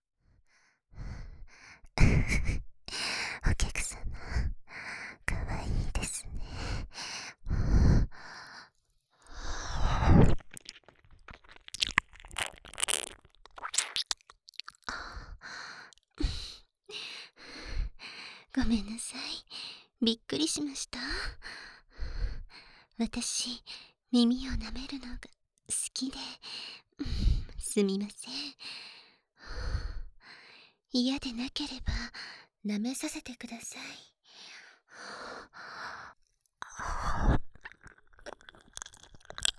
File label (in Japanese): 耳舐め SE無し; 未亡人女将のHな旅館